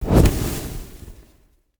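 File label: candle burn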